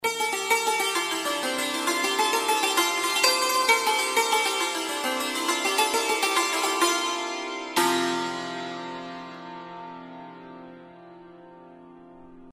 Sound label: santoor audio sample unfelted hammers